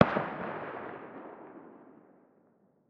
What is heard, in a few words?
revolver attack far distant; Rust